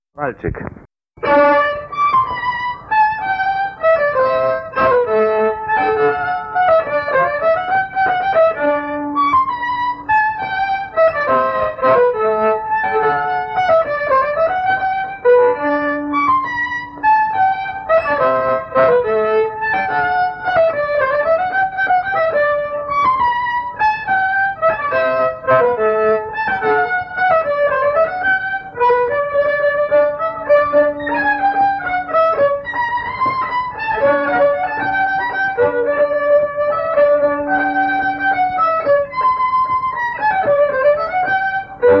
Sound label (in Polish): skrzypce, walczyk